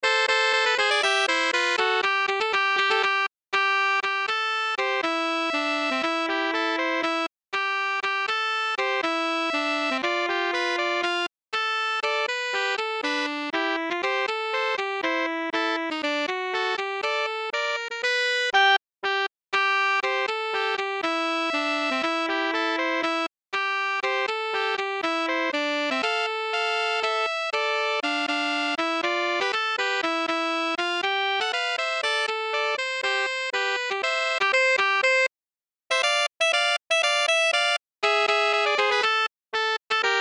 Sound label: Sax Duet